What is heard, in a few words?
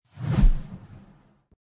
time whoosh